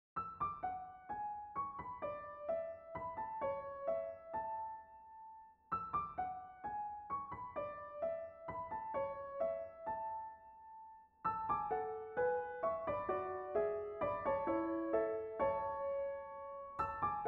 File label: Nokia tune